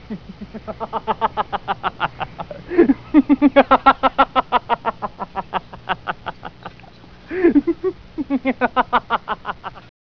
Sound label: laugh